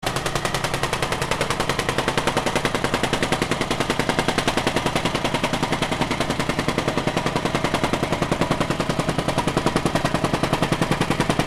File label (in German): vibrationsstampfer